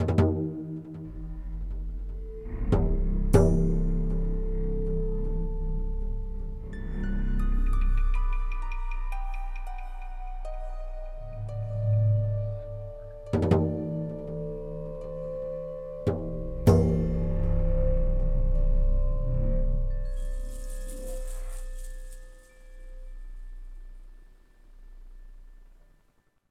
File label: Amb Indoor